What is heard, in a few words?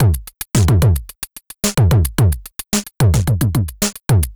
Noise Beat; Drums & Drones